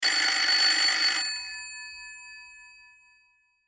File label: ring